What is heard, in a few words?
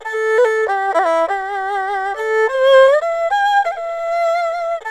ERHU; INIS; Opium